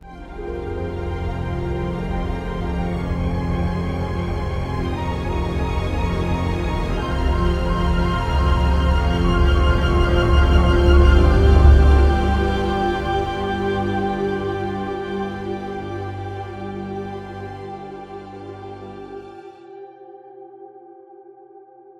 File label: Music Layer